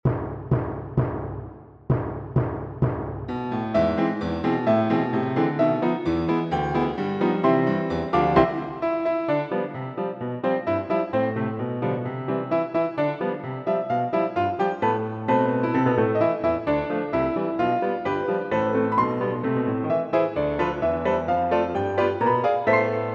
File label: Piano Four-hands and Bass Drum